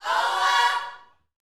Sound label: Vocal, Gospel, PHRASE; Spectrasonics